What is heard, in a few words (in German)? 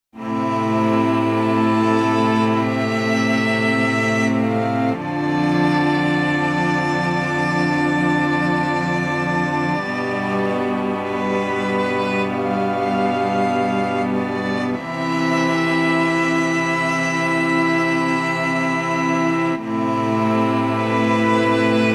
streicher